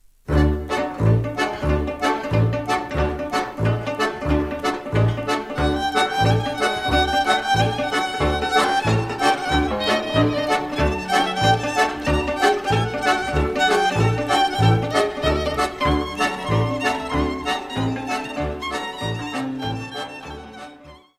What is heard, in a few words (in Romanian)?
Potpuriu de Melodii Muntenesti, Roumanie